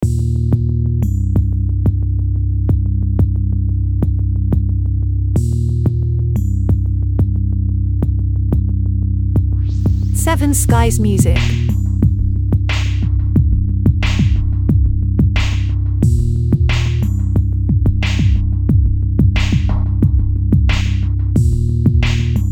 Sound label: electro construction industrial